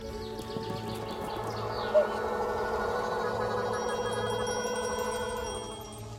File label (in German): weisses insekt; botanicula